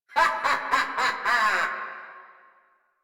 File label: Laugh, Grineer Queens Guards; Warframe